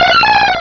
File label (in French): Cri